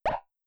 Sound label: Error